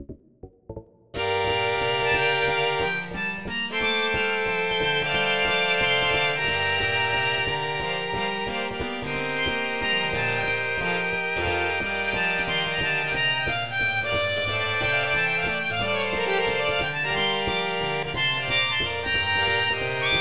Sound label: jazz